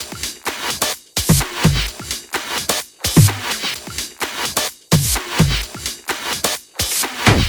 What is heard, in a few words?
128BPM Kit; VFH1 128BPM Bones Kit